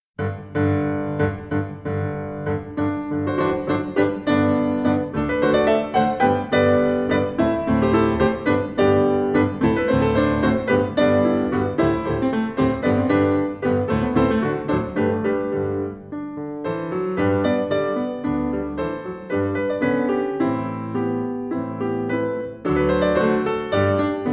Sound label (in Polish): Krakowiak